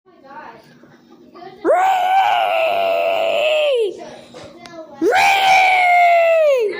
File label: Reeeeeeeeeeeeee